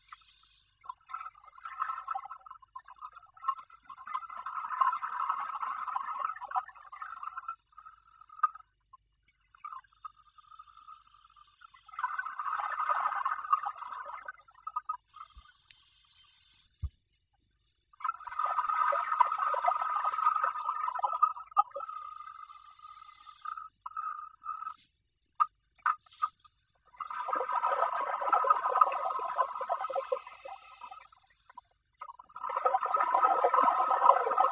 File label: swim underwater